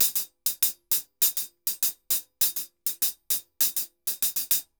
HH Bomba 100, Drums & Percussion; Best Service; Festa Latina VOL-1

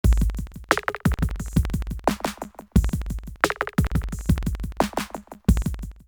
Dub Wise 88, drumloops OldSchool; X-Static Goldmine

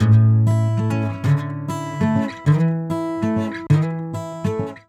Traditional Guitar 098bpm A, African Guitars

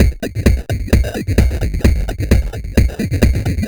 Crushed Beat 130BPM; musicradar

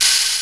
Noise Burst, E-MU Xtreme-Lead

